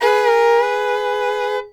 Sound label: FIDDLE FX E7; Sampleheads; Whole Lotta Country 1 & 2